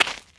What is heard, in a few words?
FSTEP, Moves; ageofwonder